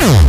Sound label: Acid Sounds H, Synths; Vengeance Samples